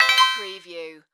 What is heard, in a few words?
Multimedia Chime